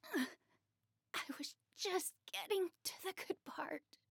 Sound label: Fairy Death; Paladins